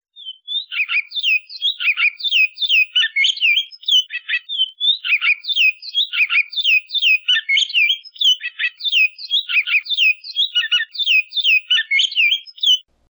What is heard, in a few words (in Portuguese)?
canto bicudo